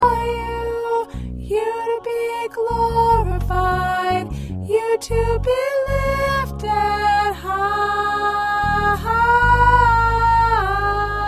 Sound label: Soprano, choir